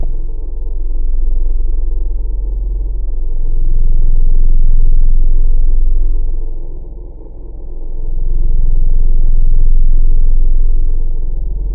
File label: Vocal Digital Choir; Wavetables; Synthetic's Serum Collection; ESW Core Wavetables; Echo Sound Works Core Tables